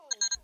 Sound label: Chat sound